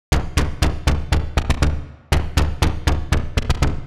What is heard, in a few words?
Chaff Perc 120bpm; horrorscape